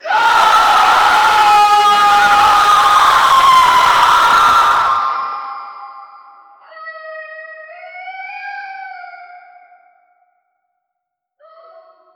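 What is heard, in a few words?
macabre laughter and screams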